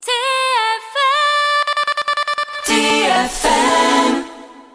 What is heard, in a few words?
TFM CHANT